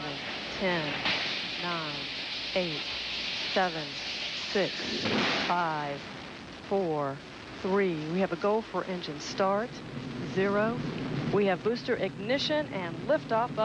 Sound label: launch; STS93